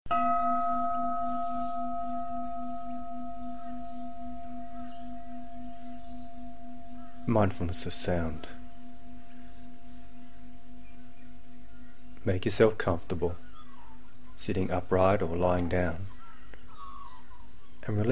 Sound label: mindfulness of sound with bells and birds